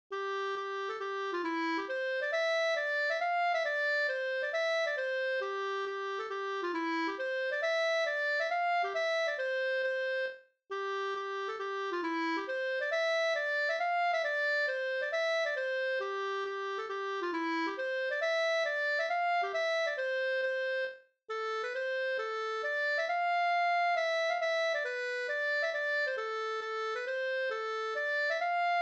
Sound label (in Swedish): hambopolska; Flickan gar till dans av Gustav Lindh